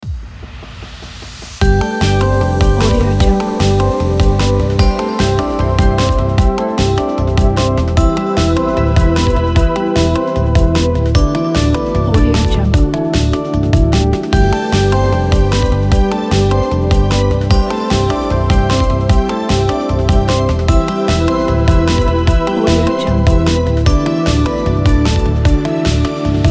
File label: happy logo